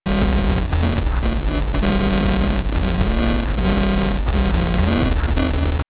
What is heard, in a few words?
noise